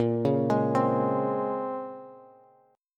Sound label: Bb Minor 9th Bb, Bass; Bbm9; arpeggiated; JGuitar